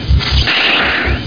shrinker; DUKE

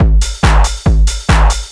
Hard, Techno Drums; TechnoMusicMaker

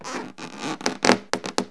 rubbe rip, riptear; Wired for Sound Pro CD; Aristosoft, Inc.

